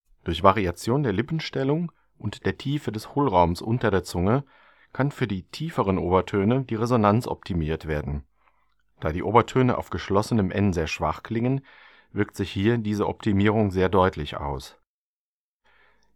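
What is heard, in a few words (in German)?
Nasen Obertöne auf N mit optimiertem Resonanzraum; Buch Oberton Singen CD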